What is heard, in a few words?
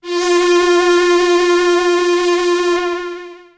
Roland D F4; Roland S100; musicradar-synth-samples